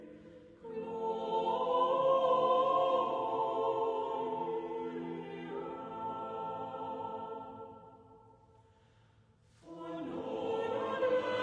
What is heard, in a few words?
carols